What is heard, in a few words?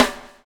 BUBL, BUBBLE; Spectrasonics - Backbeat